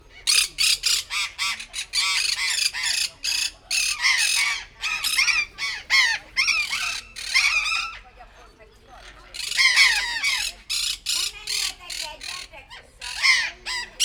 csak szikla, halkabbak, repkednek, sziklapapagáj; debreceni zoo 2019

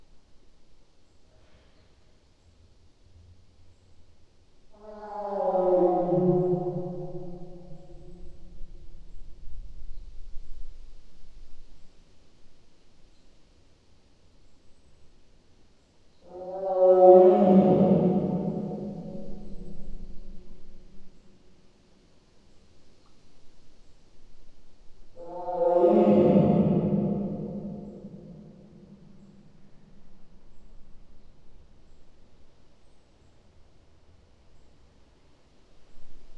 a bukó ablakból, szibériai tigris; allathangok; veszpremizoo 2013

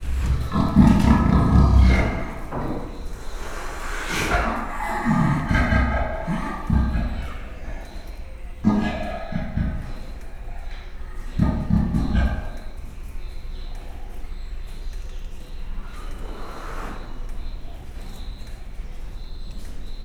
állatház, leopárd jaguár; szegedi zoo 2011

